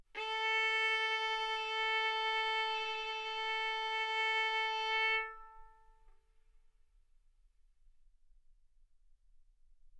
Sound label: Violin arco mf sul A A4 B4 stereo